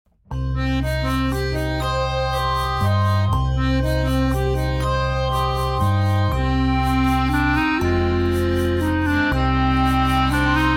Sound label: Instrumental; Hashivenu